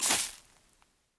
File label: object tumbleweed hit; lightning speed